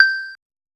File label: a short slightly brighter, metallic tap